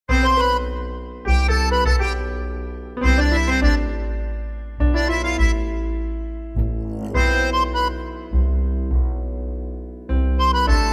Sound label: Tango Mood